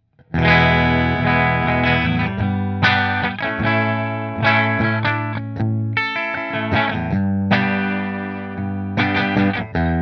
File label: Nola JB14 Flame Bridge Split Fender